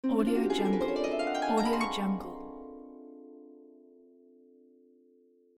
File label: harp; dj-boronin